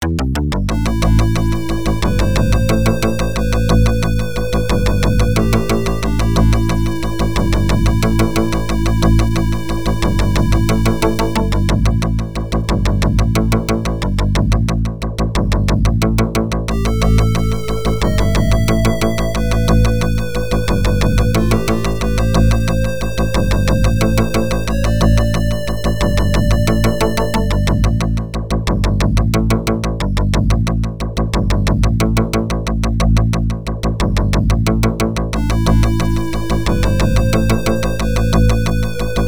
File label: Dungeon; Eye of the Beholder; PC-9801